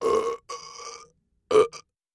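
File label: Double Gasping Belch, belching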